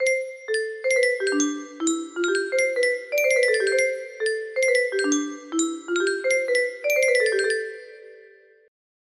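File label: music box melody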